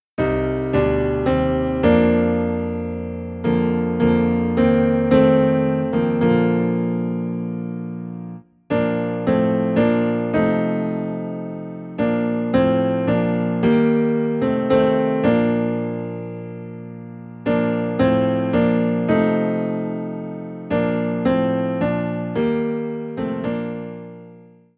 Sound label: Piano, Low in B Flat; Be Still My Soul